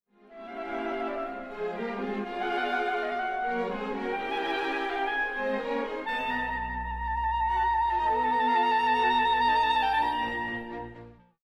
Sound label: trill